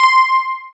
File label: GUI, E-Guitar